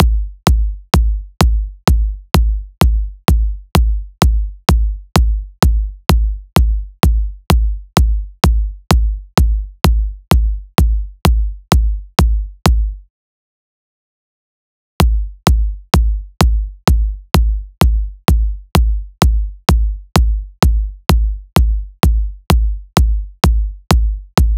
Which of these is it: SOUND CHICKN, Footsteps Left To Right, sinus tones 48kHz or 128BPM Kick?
128BPM Kick